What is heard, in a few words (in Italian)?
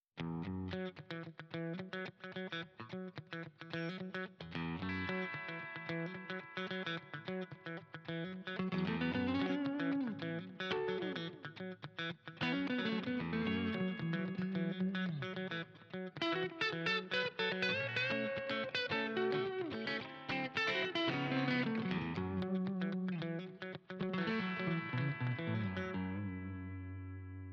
ritmica midle accordi bridge solista neck